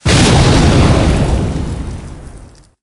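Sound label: fire ball explosion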